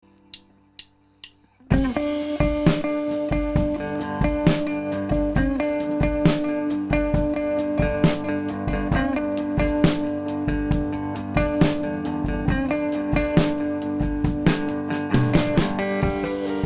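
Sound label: 1 Mic Drums with Guitar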